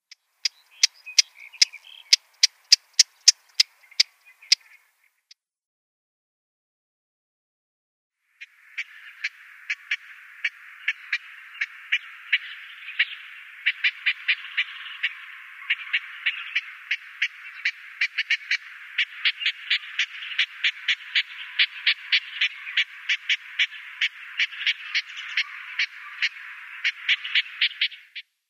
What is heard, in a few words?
Eastern Olivaceous Warbler calls